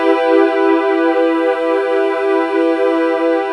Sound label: PAD Dance; Best Service Gigapack 1